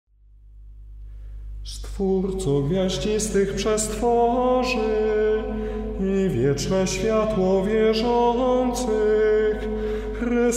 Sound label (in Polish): Hymn Stworco Adwent; Melodie Liturgii Godzin